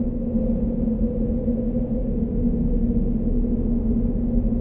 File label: wind